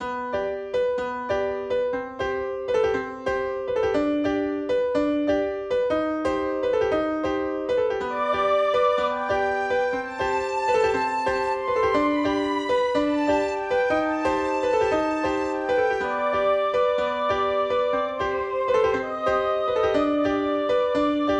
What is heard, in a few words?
piano project old